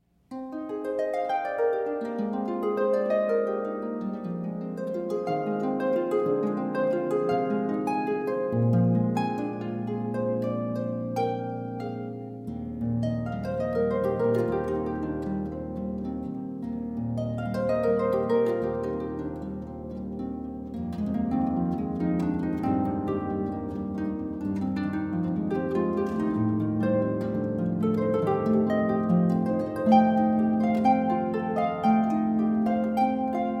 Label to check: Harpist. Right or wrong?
Right